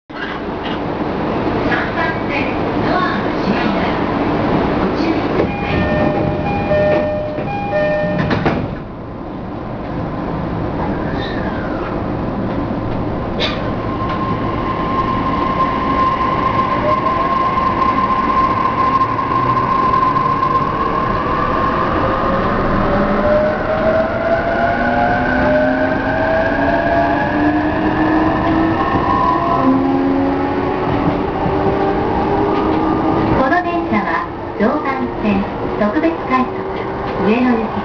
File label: e531, train motor sound; toride-kashiwa